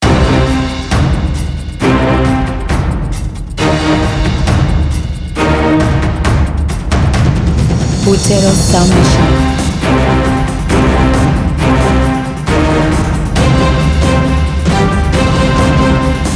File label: epic trailer intro